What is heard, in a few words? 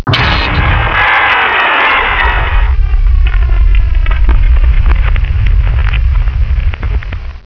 fly; flyball; flier